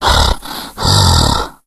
hulk breathe; Left 4 Dead; Source Engine; tank

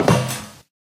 metal grate, footsteps

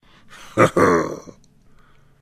quick laugh; heavy; taunt; tableflip

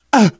hurt minor, survivor voice, manager; source engine; left 4 dead 2

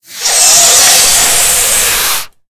suit deflate; video game; die; ceda; Left 4 Dead 2; Source Engine; infected

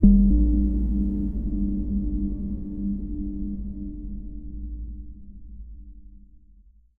editor blips; Source Engine; Portal 2

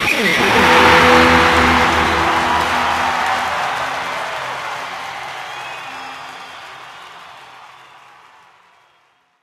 achievement earned; Source Engine; tf

